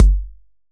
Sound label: kick layer; kicks